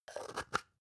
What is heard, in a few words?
spice jar scratch close soft; industrie wirtschaft; gastronomie